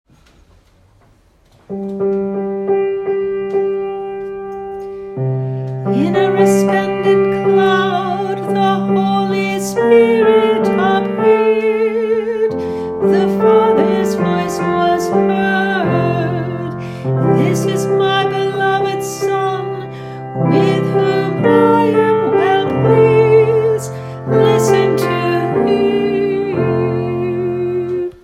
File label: Antiphon; Transfiguration